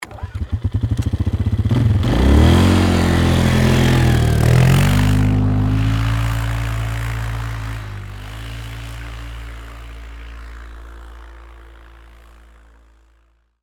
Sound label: ATV Away, Sports, Atv, Start, Up, Pull, Away, Polaris, 500Cc, Four, Wheeler